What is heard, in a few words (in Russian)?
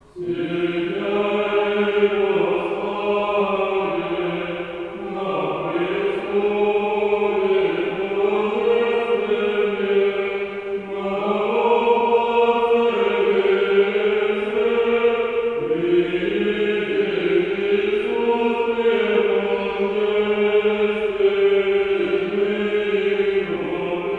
Благовещение. Ирмос 4 песни; Седяи во славе